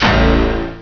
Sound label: TRANSPORT OUT SOUND; Escape! (2.1)